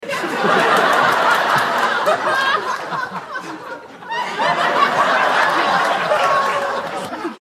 plateia rindo; efeito sonoro

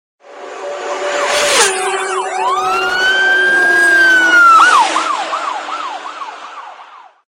carro de formula 1 e policia passando

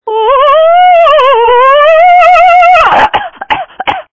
Cantor de Opera

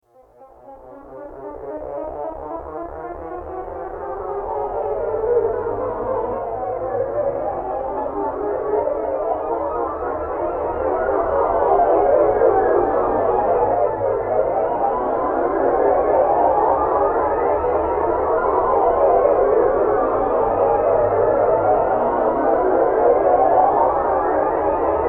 som confuso

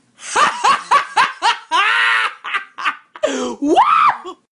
risada ironica

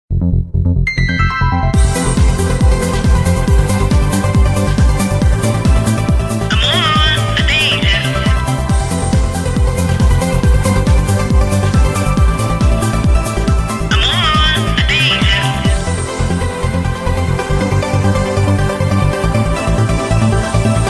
nokia amor atende

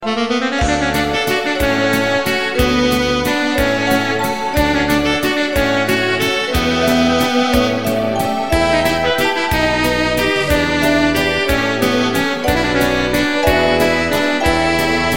toque de valsa; toques para celular

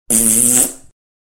peido fraco ringtone

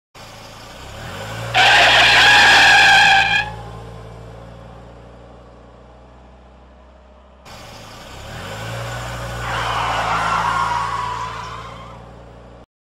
carro freando efeito sonoro

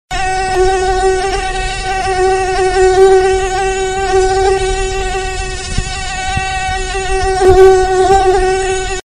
som de abelhas

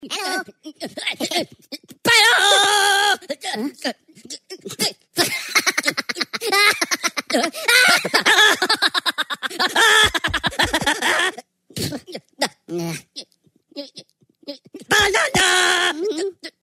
risada; minion

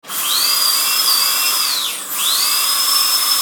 maquina de dentista efeito sonoro

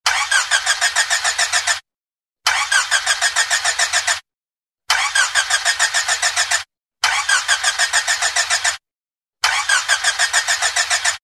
som de carro ligando

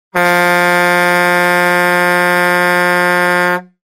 toque de busina de caminhao; buzina de caminhao

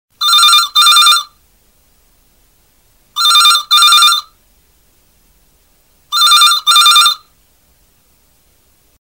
telefone muito antigo

